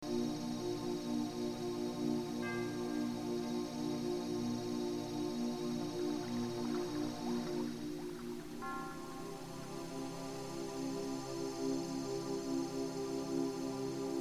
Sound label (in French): synchronisation ondes cerebrales; elevation esprit